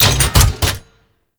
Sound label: Sniper Mech; Kahl Sniper